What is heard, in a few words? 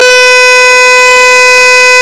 Horn Sms